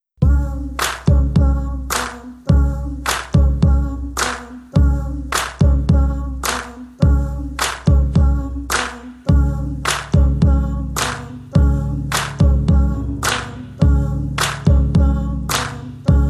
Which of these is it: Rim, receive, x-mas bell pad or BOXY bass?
BOXY bass